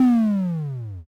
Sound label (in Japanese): zuun